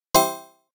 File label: Text Message; Mumble